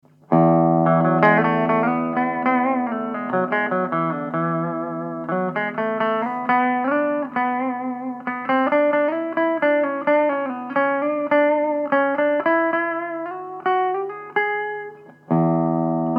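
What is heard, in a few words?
samick ra10bbl clean and distortion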